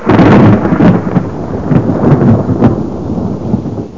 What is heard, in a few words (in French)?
Tonnere; fightingspirit